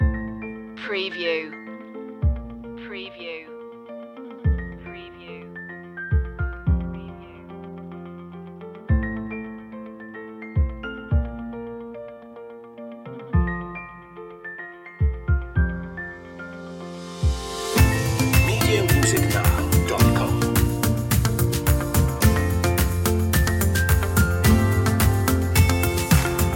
taking flight no vocals

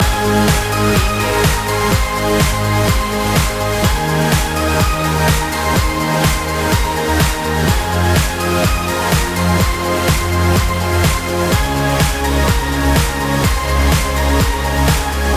heartburst loop

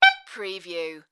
Sax Squeak

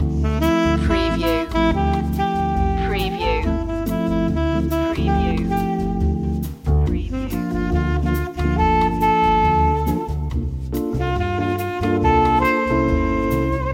bossa nuevo loop

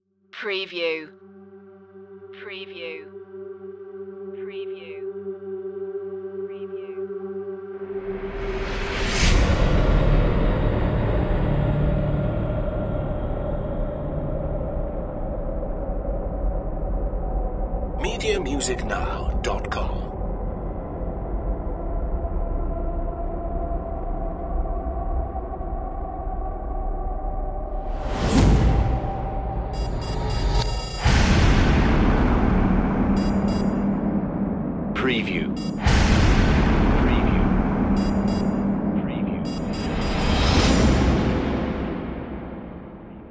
genesis effects only

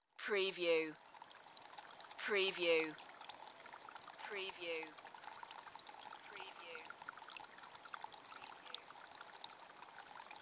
Welsh Mountain Stream; beanstalk audio

